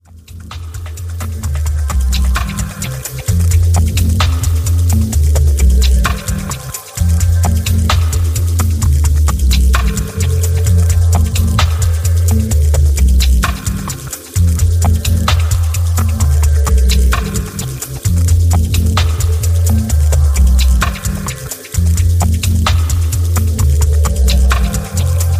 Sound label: joyful noise